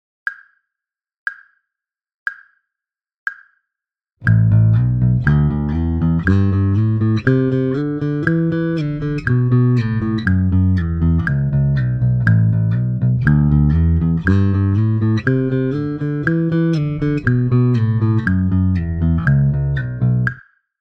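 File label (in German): Slide Übung, bass; Slide-Uebung-3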